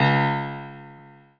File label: Piano Ff